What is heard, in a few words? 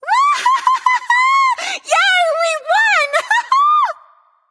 yay light; smite; voicepack; hel